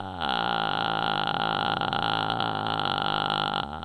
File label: Creaking pur